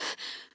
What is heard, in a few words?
Breath; Lewd SFX